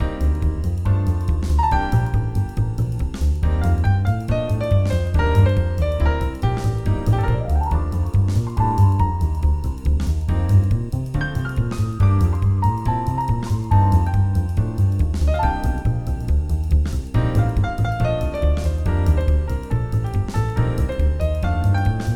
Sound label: piano solo; gamerip; I Expect You to Die 2